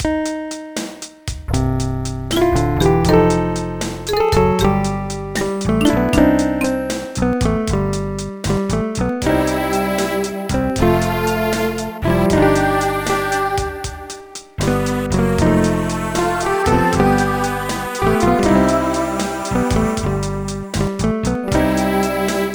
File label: battery; Studio Magic